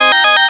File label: Ring, Cisco Unified IP Phone 7945G